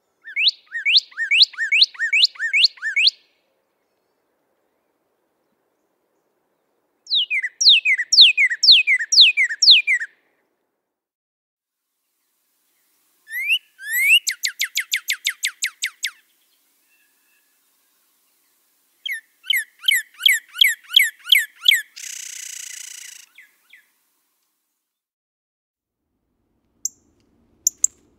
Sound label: cardinal bird sounds calls and song